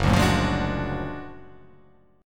F sharp Augmented Major 9th F sharp

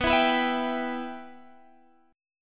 B Suspended 4th Sharp 5th B Guitar Drop D

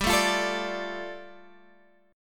G 7th Suspended 2nd, Guitar Open G

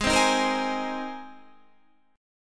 G sharp Major Add 9th, Guitar Standard; G#add9 strummed; JGuitar

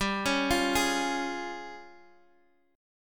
Db Major G; Db/G; arpeggiated

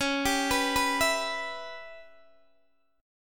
Db Minor 7th Flat 5th, Guitar, Open D

